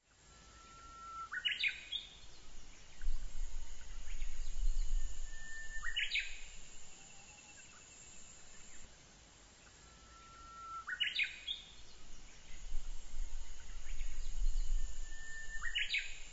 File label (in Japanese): uguisu